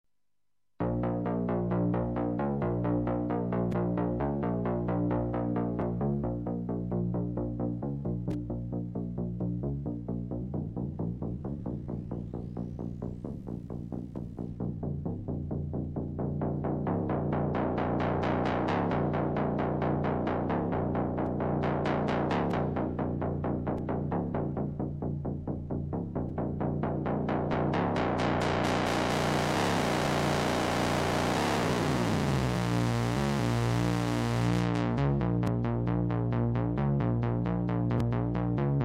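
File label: redsquare, synth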